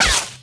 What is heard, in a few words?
saber hit body; impacts explos